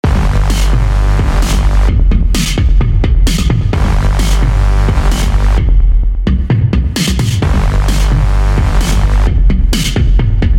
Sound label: bass; soap; weeklybeats-2020